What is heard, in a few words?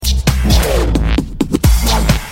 Dj Electro Sms